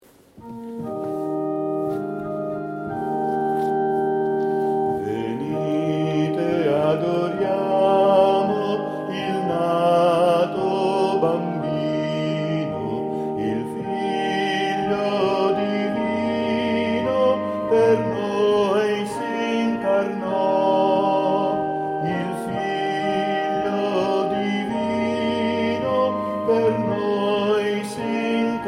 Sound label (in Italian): Sop Contr; Natale; Venite adoriamo